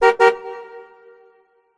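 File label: Ambience Tennis Crowd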